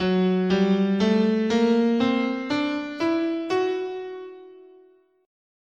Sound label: F sharp Altered, scale